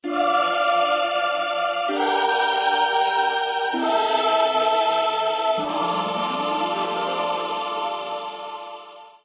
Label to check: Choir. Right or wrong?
Right